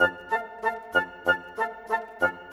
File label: Bassoon Flute, Orchestral; Sonic Foundry (Sony Creative Software); Orchestral VOL-2; Modern 29